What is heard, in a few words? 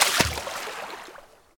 swim, liquid; Minecraft; Conquest Default